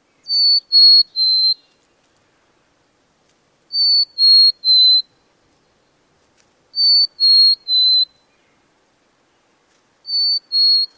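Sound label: songs, mountain chickadee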